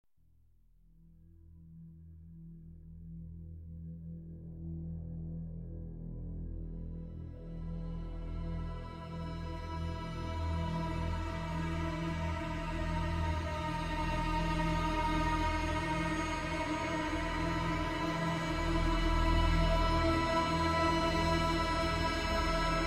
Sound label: Ambient Pad